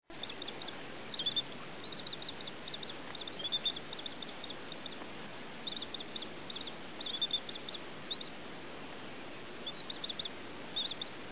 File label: Birds; Florida; Sea Shores